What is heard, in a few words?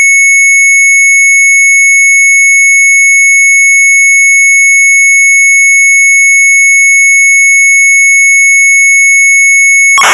Sharp MZ800 Tape; BOMBERMAN 800; retromelsarchive